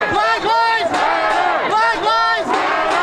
protesters chant black lives matter before marching; web extra